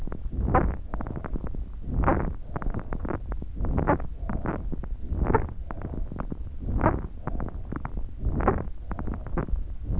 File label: squawk